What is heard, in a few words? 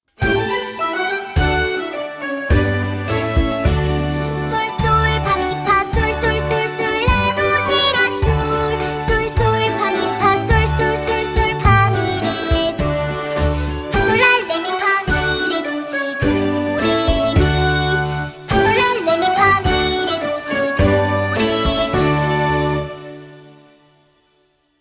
scale